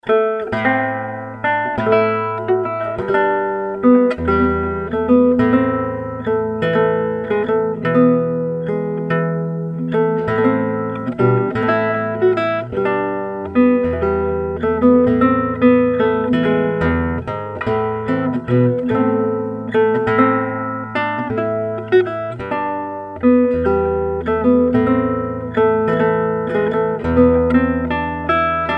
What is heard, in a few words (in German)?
laute